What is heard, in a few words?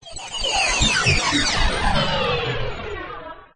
motorcycle